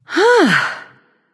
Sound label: sigh; smite; medusa